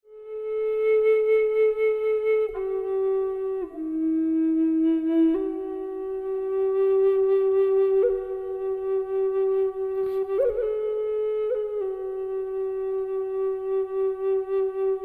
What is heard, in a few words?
indian, indian flute